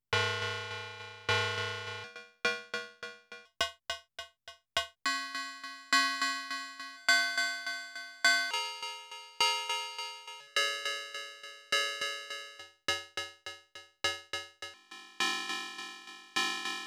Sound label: Fire Instruments COW